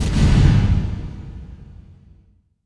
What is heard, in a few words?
robo beat